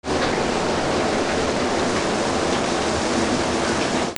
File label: BATHROOM